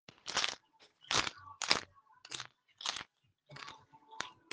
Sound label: Gf Eats Popcorn